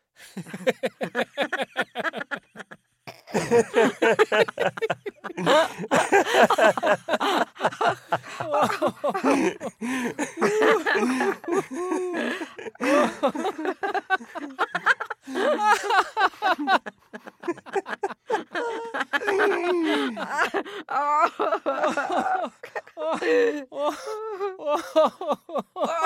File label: Group of nervous laughs